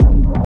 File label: sloppy classic techno kick; single hits one shots; kick drum samples